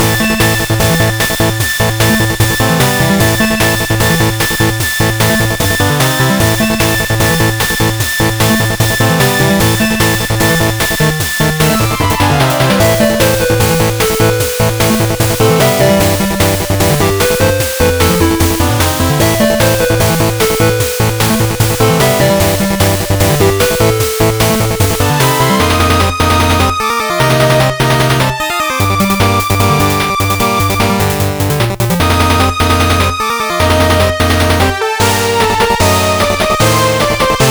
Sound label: Battle Main; Twin Blades of the Three Kingdoms